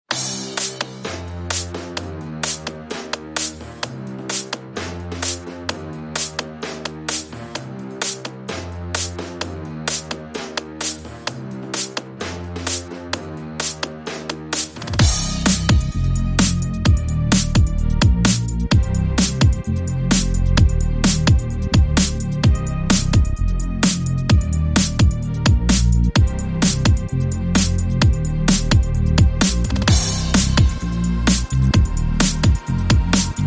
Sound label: No Staccato Strings No Piano No Bells